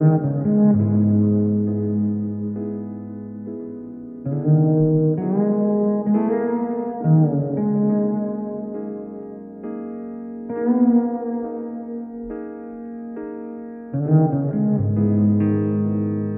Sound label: piano and bass, sad loops